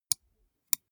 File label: gaming mouse left click single slow soft; maschinen; buero